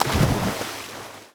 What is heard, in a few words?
water impact; MagicArsenal